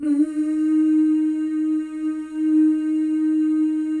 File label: FEM, Symphony of Voices; Spectrasonics